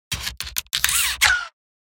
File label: ROBOT SMALL